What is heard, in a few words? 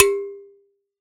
Finger, Prepared Kit; Battery 3 Sample Kits